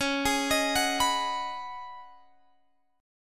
C sharp 7th Suspended 2nd Suspended 4th C sharp down Guitar Open G